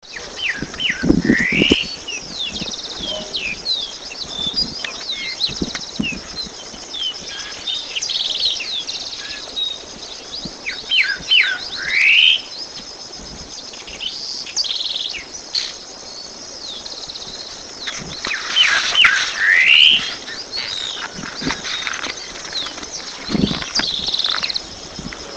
Pepitero Gris, Saltator coerulescens, Greyish Saltator; cantos